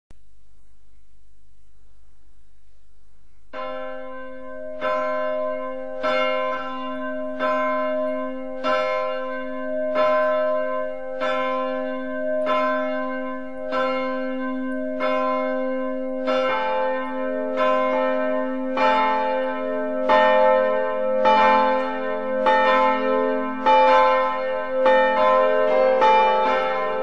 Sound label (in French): volee 7 cloches